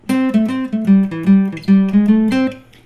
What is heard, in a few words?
Lick